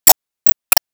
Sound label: Enemy Woodblock Minion Active Shot; gamerip; disney star smash; android